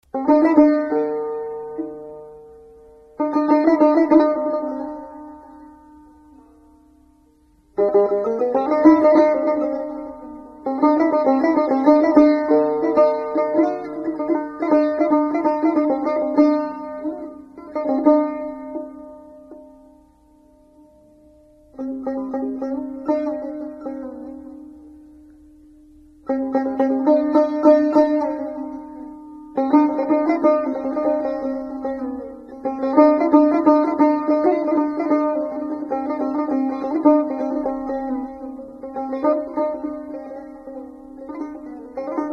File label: tar